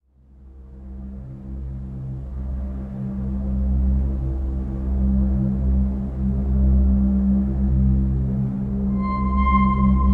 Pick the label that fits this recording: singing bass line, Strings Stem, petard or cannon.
Strings Stem